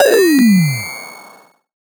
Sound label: 8Bit Fx; Antidote Advent